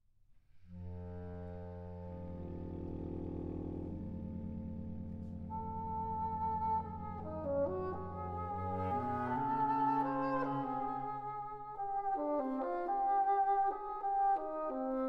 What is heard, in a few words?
Concertino; Hartmann, Hindemith